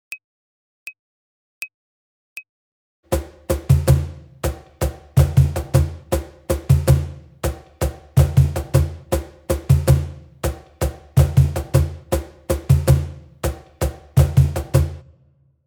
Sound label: Samba Var